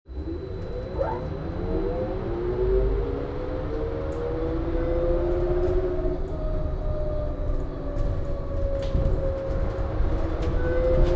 Modern Transit Bus Ride; olofonia

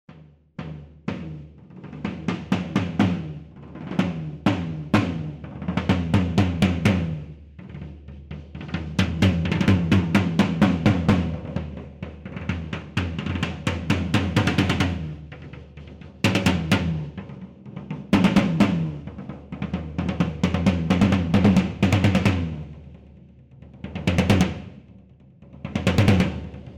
Timpani; olofonia

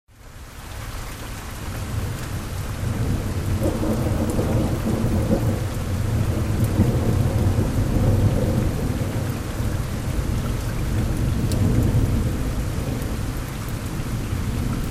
Oklahoma Thunderstrom; October; olofonia

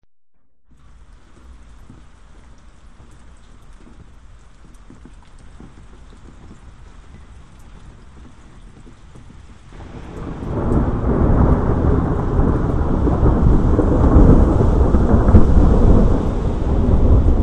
Thunder Storm, Olofonia

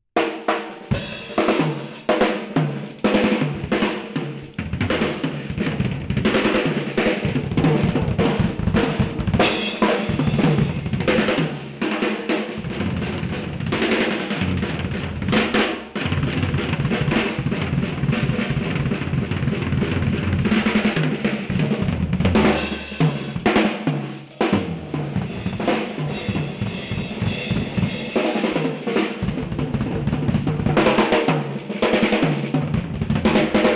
Solo Drum; olofonia

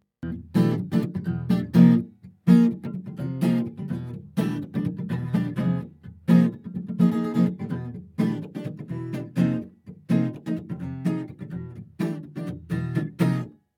Riff Guitar, Olofonia; L'olofonia è una tecnica di registrazione e riproduzione audio eseguita tramite uno specia

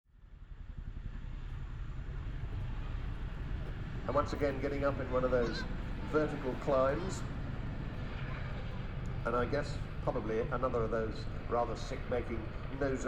Chinook Helicopter; olofonia

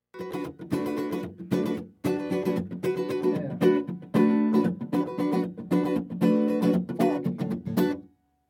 Funk, Olofonia

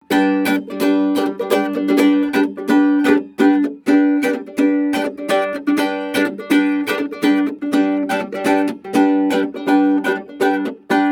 riff; olofonia

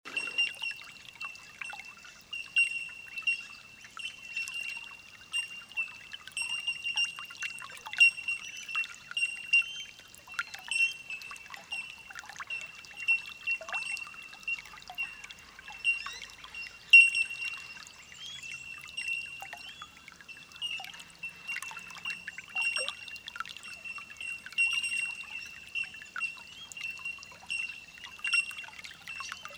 Bell Birds, Olofonia

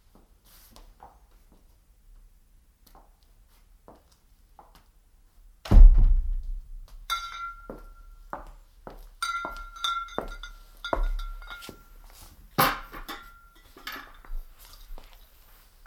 Mineral Water, olofonia; L'olofonia è una tecnica di registrazione e riproduzione audio eseguita tramite uno specia

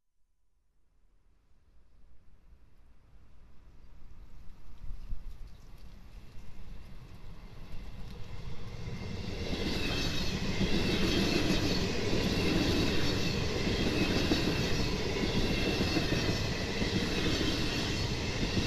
Train, olofonia

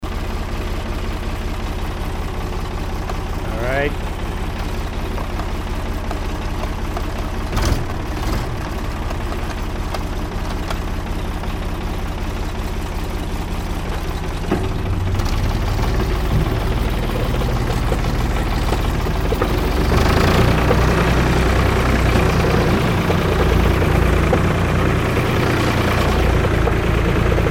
Back Hoe Running; olofonia

